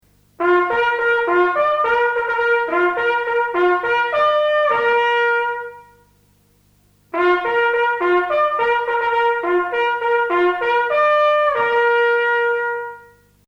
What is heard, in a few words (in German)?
postillonruf